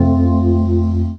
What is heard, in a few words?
noisy akai organ chord; Organs